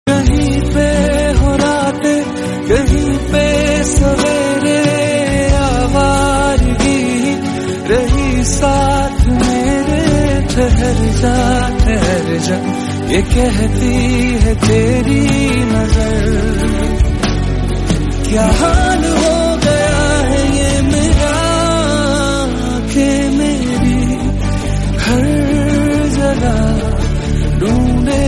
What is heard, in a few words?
Hindi Love Romantic